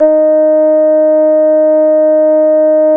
SLAP D#1, Bass; E-MU Producer Series Vol. 6 – Analogia Project (CD 1); Boom da Bass 1

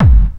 techno 2, bassdrum

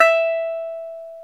GTR, Dobros, National

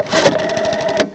DIAL; CMI Sound Library Rev 1.3

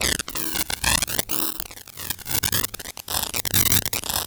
STATIC; Spectrasonic Distorted Reality 1

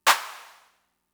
Clap, Fricke MFB-522, Drum Machines

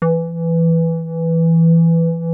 JUNO 60 E4; Hollywood Edge - Giorgio Moroder Rare Synthesizer Collection

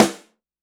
DRYSN, ST DRY SNARE

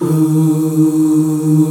VOICE OOH, Voice Oohs; E-MU Producer Series Vol. 2 – More Studio Essentials; Composer

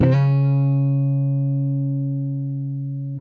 JAZ OCT SLUR, guitar; Quantum Leap - Guitar & Bass

